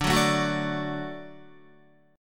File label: D Major D down Guitar; D strummed; JGuitar